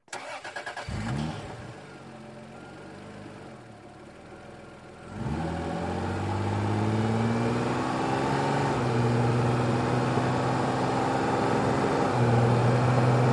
SUV Engine Accele

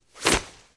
Nylon Swishes Quick, whooshes & swishes

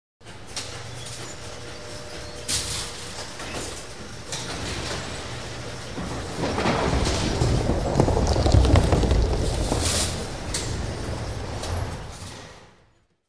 Bowling Pin Setting

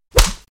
Bullet Hits Body Wet I

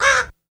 Crow Caw Single

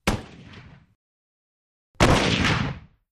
.50 Caliber: Single Shots. Big One At Tail

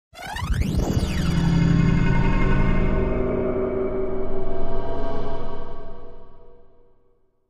Stab Fluctuating, stab

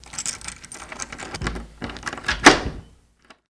Dead Bolt Unlock with Key Movement; lock & keys

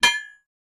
Metal Hit Ring, small impacts

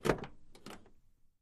Camaro Door Open Thic, chevy camaro

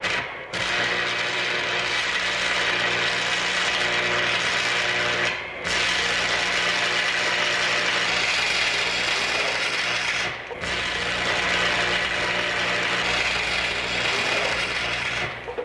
Elect Arc Welding, arcs & sparks

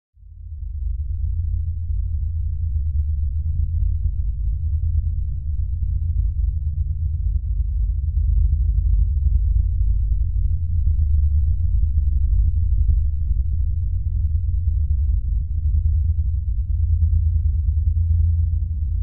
Bed Galactic Drone

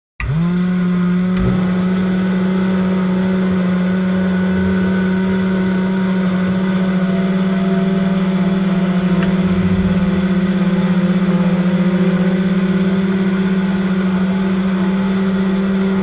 Electric Motor Large

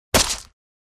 Sword Or Bayonet Juic, stab

